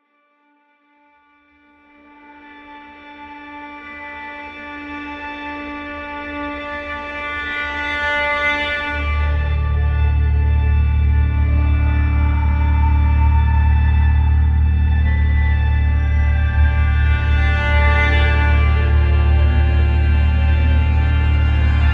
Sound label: Horror Voices; Horror Vol 4 Music Pack